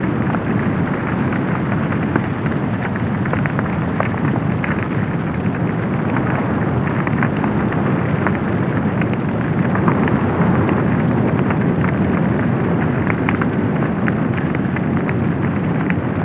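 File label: fire house distant; Explosions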